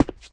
boot

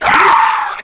death

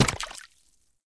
splash

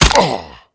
fall

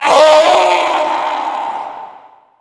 falling

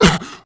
jump

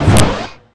health

